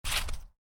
Footstep snow shoe, Shoes: Individual Footstep On Snow, Light; Human; Footstep Sound Effects; Footsteps (Sound Effects:Human); Stuart Duffield